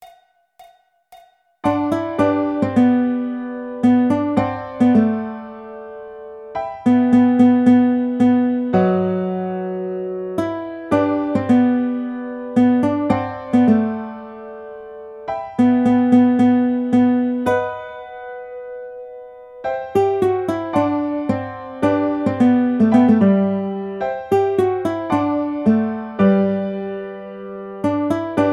Baritone, ukulele

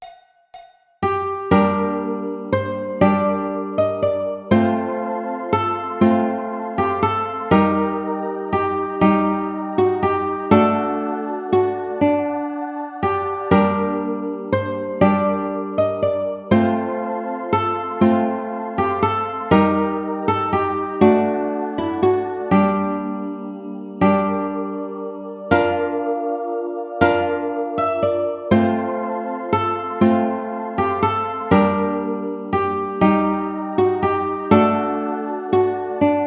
Ukulele; Greensleeves

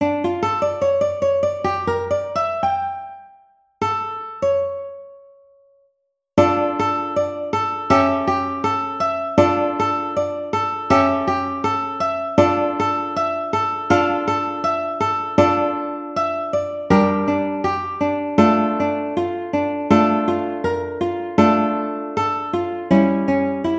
Sakura Sakura Claw Ukulele

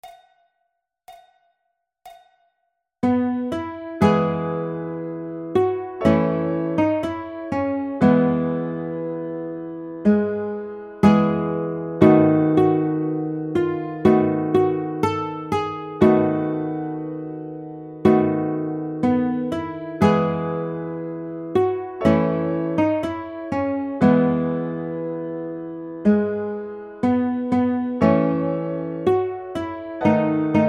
Aloha Oe Baritone, ukulele; Jolly Roger Ukulele Book 1

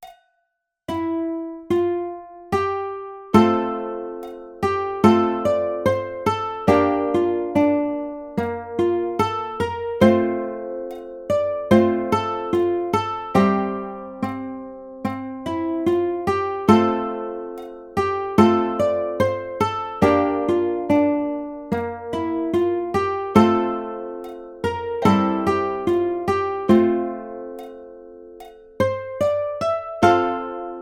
Danny Boy Ukulele

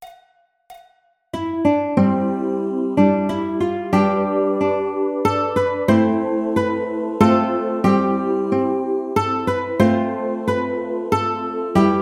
Ukulele; First Noel

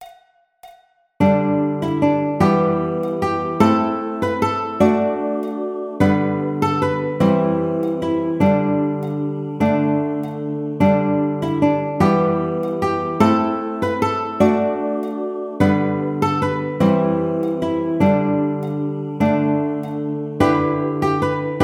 Skye Boat Song Baritone, ukulele

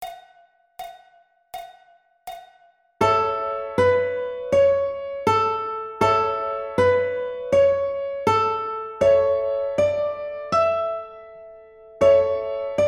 Frere Jacques Ukulele

